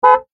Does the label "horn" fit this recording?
Yes